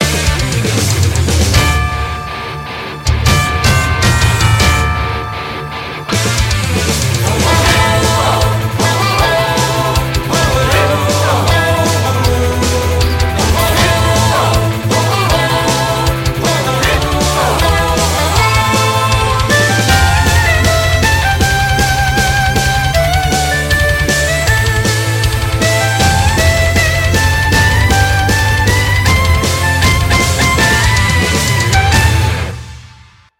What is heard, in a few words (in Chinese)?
二胡; B段